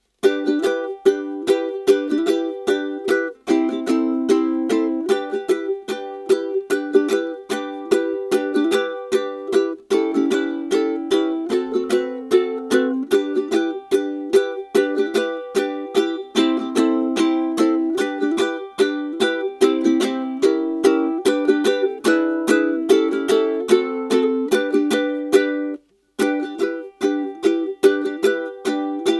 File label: Country Rock, ukulele